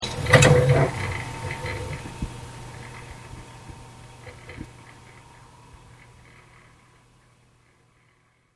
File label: boot